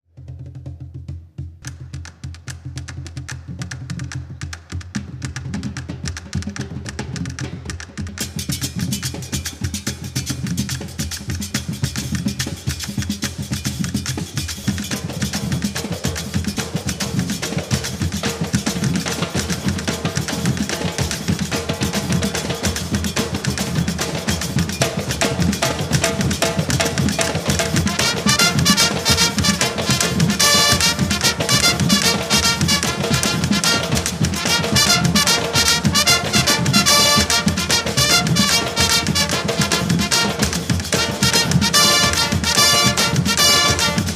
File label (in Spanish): Toque de Diana